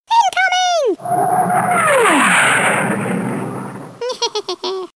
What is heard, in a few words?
Incoming Sms